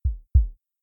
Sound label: Heartbeat Normal; LifeSafer LowHealthWarning